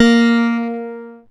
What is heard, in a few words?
A#, PIANO; Big Fish Audio; Synclav FM